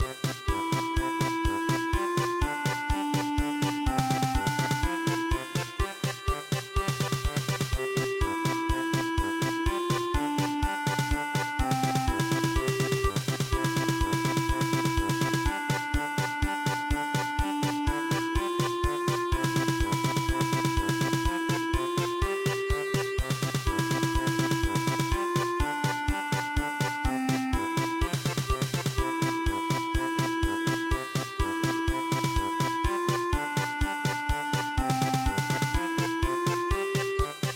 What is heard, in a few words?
German Polka